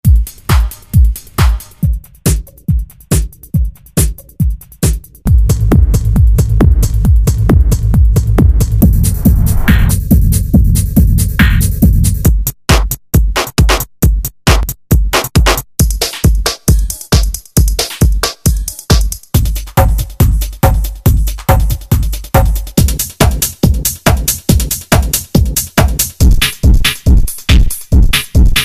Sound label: Street Kits Trance Hard House